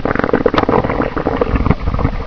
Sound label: birth; video games; source engine; hl1; gonarch